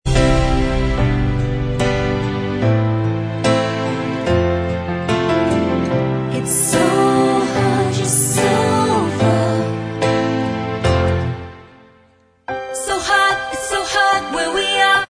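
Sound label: backing vocals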